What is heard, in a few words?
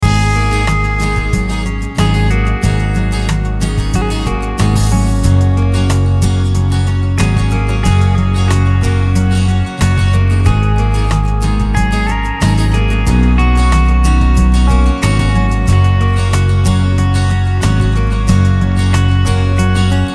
INST Landscape